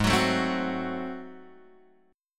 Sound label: Ab 7th Flat 9th down Guitar Open G